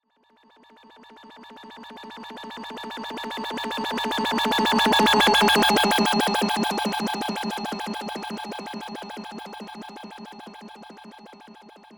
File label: Hover Robot Pass By, Scifi; Robots; BlastWave